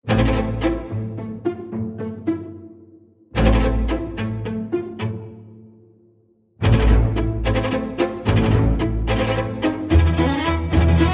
Chamber

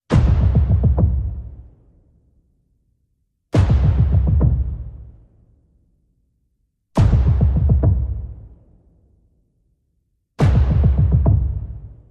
Percussion and FX

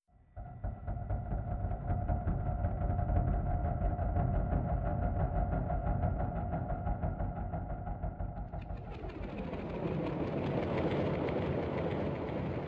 Strange Ambience

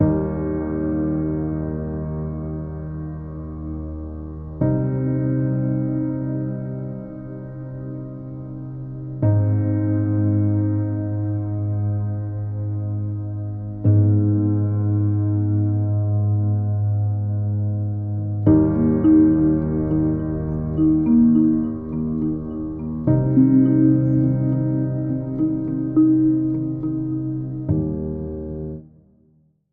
Calm Organic Atmosphere Underscore; 30sec

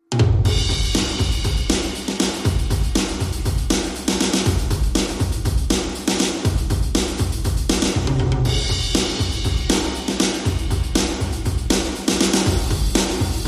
Drums and Bass Mix

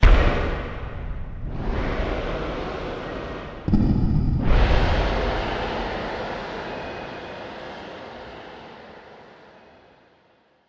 Low Boom Sweep

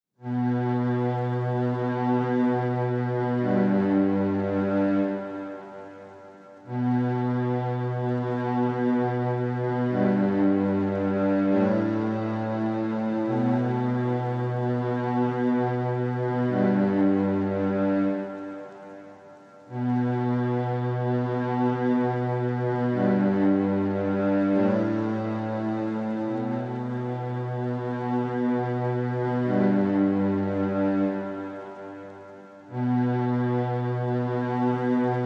Cellos Only

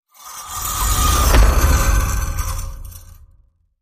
Fingernail Scratches Sweep Hit

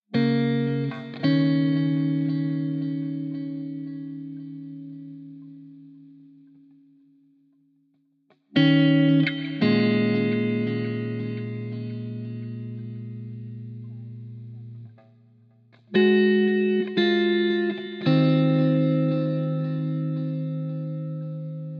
Guitary Mix

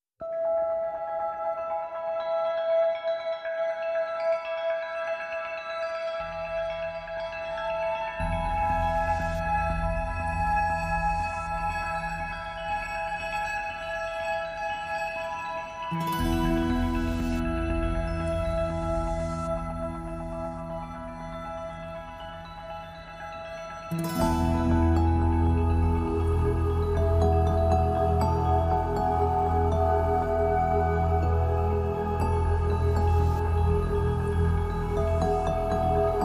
Bed Mix

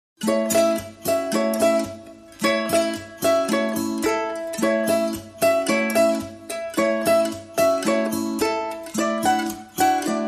Guitars Only

